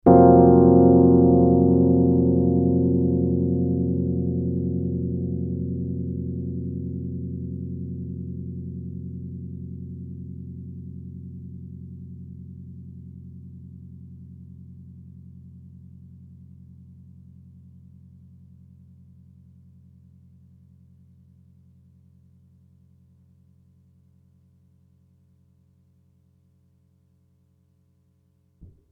rhodes chord f sus b9

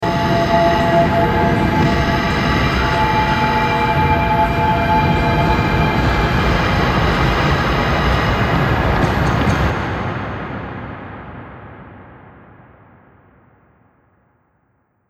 reverberated metal scraping

